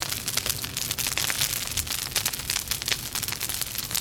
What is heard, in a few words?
Jungle Campfire; gnarled forest